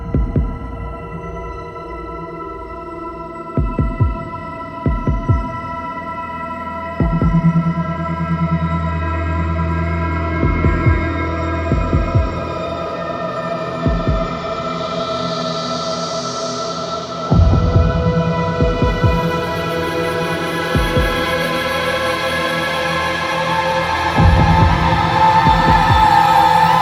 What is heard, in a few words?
Quest Prelude; Warframe; Cinematics; Zariman